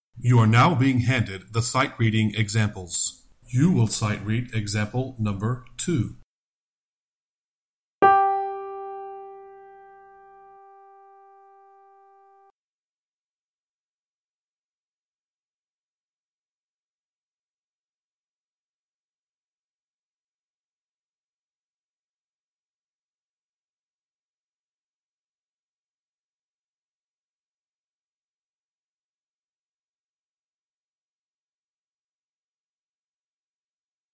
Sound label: Sightreading Example Soprano